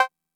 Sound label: clave; MFB-522